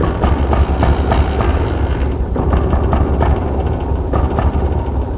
heli sputter

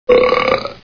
belch

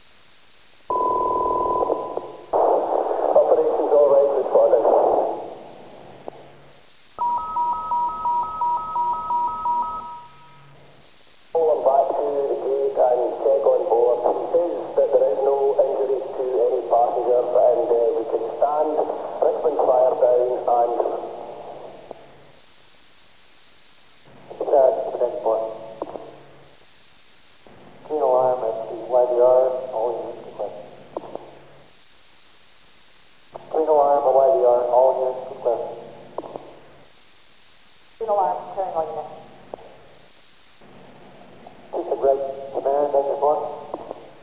firetruck radio

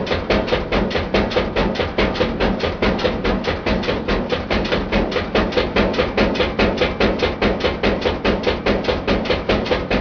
washer

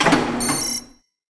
button; depths

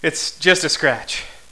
wound

